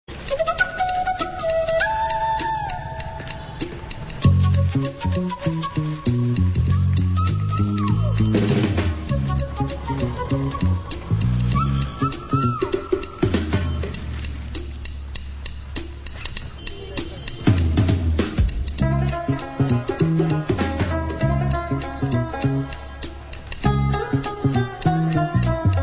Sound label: beautiful music